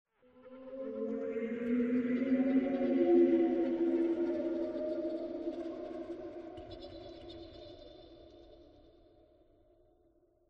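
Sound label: random laughs; ambient; Half Life Alyx; xen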